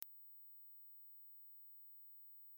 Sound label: Mosquito Ringtone